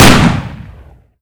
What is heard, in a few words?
Super Shorty fire; Weapons; Firearms